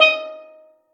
Steel Drum Eb5

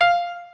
piano

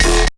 bass c

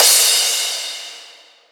splash; osu

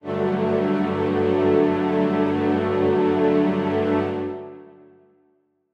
Strings start

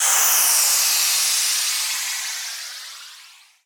BK Sweep; osu

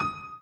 Piano Chord

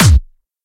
kick

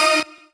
normal hit normal

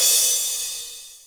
Drum Finish

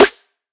snare

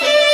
violin; osu

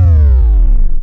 Bass Kick

